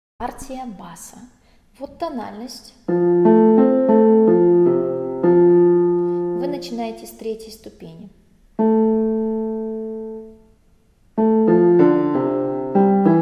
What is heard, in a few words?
bas